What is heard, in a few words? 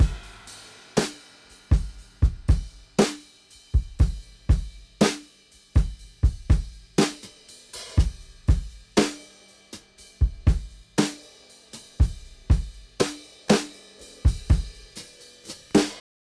120 drum loop; the corner kit